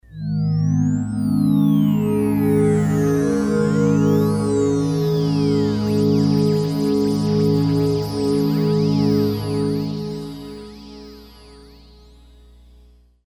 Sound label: Nyquist Pad; Kris Rhen Presets